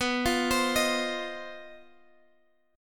C Minor Major 7th Double Flat 5th B

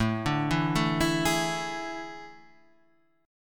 A Major 7th Suspended 4th, Guitar, Standard; AM7sus4; arpeggiated; JGuitar

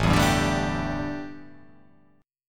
A 7th Suspended 4th, A, down

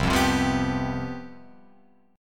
C Major 7th Suspended 4th C down Guitar Open F; strummed

